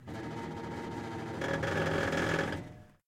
Elevator Creak

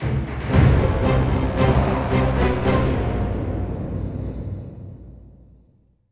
Music Fight Vikings Theme Hard End; asterix obelix slap them all 2

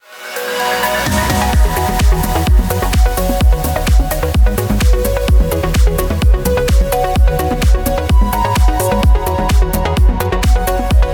BGM xfade; Invaxion

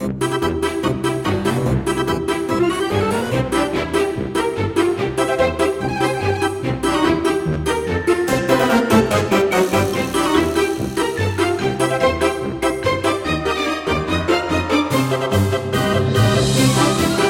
Carnival; Angry Birds Reloaded

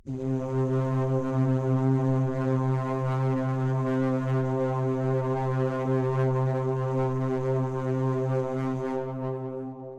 Angry C2; Harmony Hermit

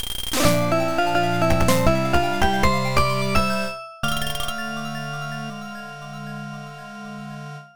Victory; Touhou Yumejikuu Phantasmagoria of Dim. Dream.; PC-9801; OPN; Victory Demonstration

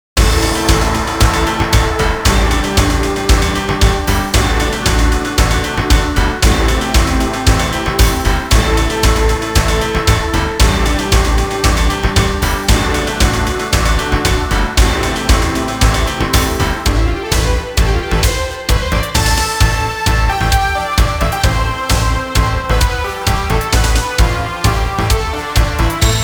Dirge; World Heroes 2; PC Engine

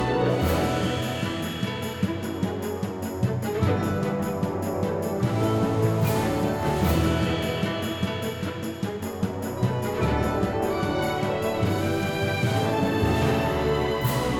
Music Village Power Up

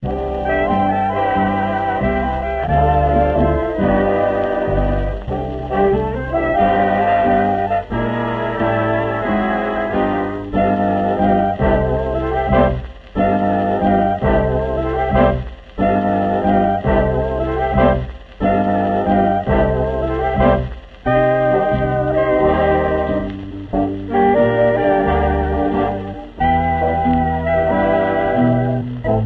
Vintage Tune

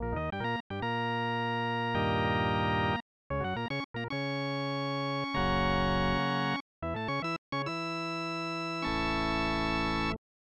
Charge!; MLBPA Baseball

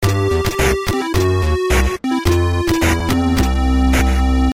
gameover; Strong Bad's Cool Game for Attractive People; gelarshie

